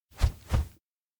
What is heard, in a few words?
bear suit beckon; awayuki